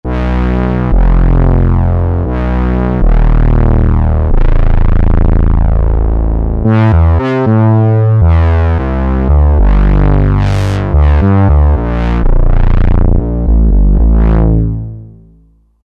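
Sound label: Bass Pedals, Roland SR-JV80-04 Vintage Synth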